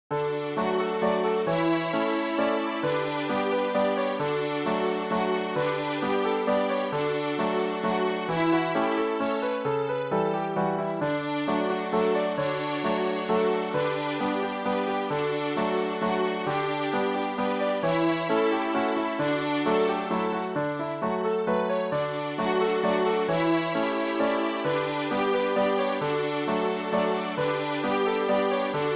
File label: Waltz